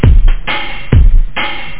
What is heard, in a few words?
drum loop; phat trax samples